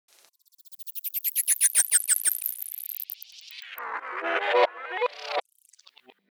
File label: Movements; 99Sounds; The Weird Side Samples